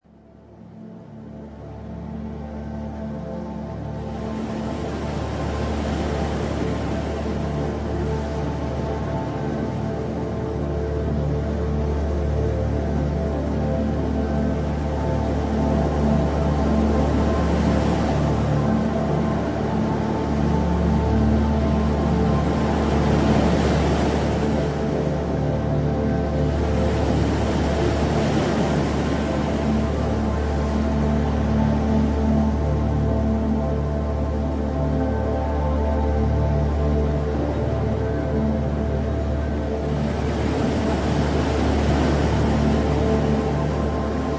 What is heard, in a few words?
Relaxation Ocean